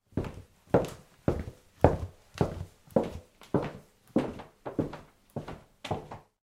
Footfalls Left To Right Hardwood; footsteps

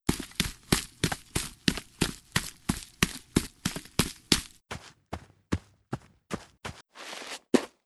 Footfalls Sneakers Dry Fast Slow; footsteps

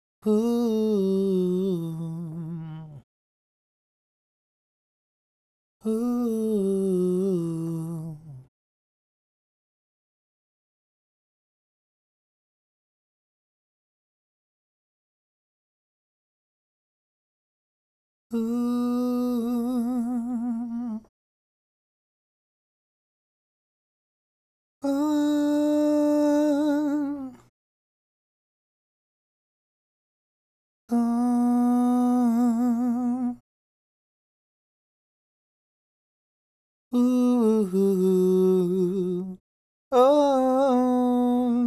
Vocal Backing

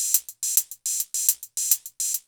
808 Loop Hats 105, Roland 808 Drum Loops, 105bpm

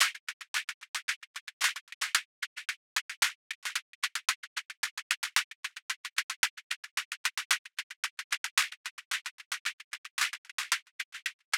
112 Percussion, New Age Ambient Loops; Spring

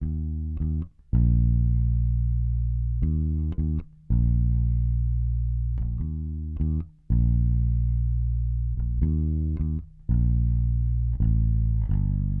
Bass

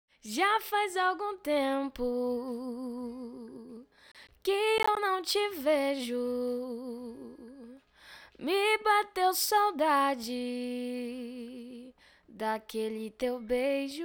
Lead Vox

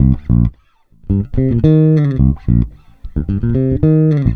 Groovy Electric Bass

